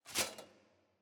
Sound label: ambience room armor training